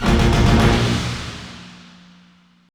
Stinger; Ben 10 Protector of Earth; Wii

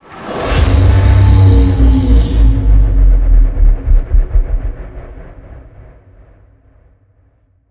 Stinger Modulate; extinction deluxe edition; ps4 windows xbox one

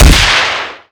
Train Explode Burst; Train SFX Explode Burst 01

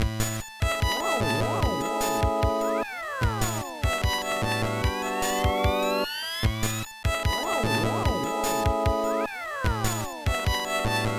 Continue; Kotoba no Puzzle Mojipittan Advance; GBA

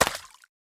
Water Gun Impact

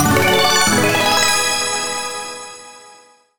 Advmap Clear

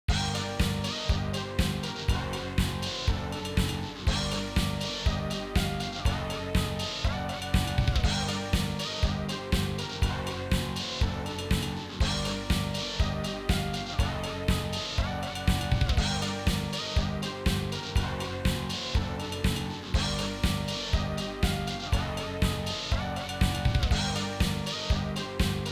Continue; Cyberbots: Fullmetal Madness; CP System II

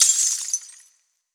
Mirror Crash

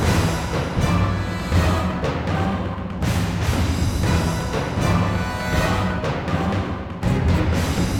Bell Tower, bell fin

